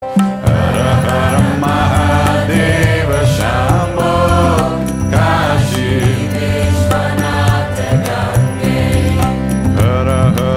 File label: Hindi Song